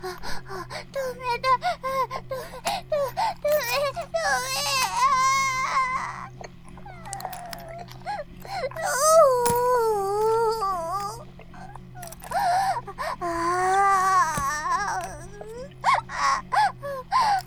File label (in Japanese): バイブ放置; イクたびにわけて